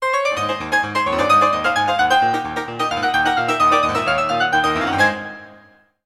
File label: Music Wheel of fortune; Hub